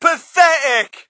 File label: crowd, shout; Mike Tyson Heavyweight Boxing